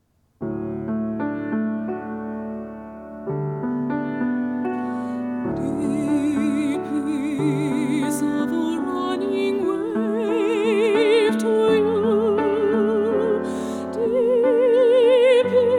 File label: gaelic blessing; Service of Worship